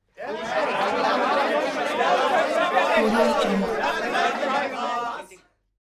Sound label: human crowd male crowd grumpy short; smartsoundfx